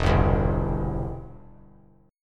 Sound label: E Minor Sharp 5th E down; Em#5 strummed; JGuitar